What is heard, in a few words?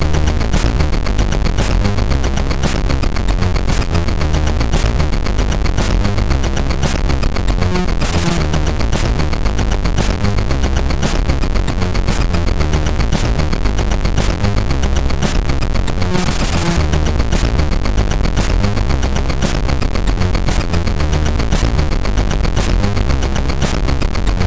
Spook tune MOS6581R3; Spook tune 2; hvsc